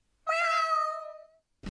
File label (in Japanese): 猫の鳴き声